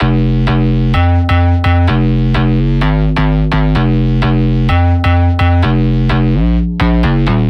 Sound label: 128 Fm Donk Bass Thing, Melodic Loops; Electronisounds